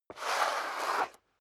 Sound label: step leather shoes concrete slide long; schritte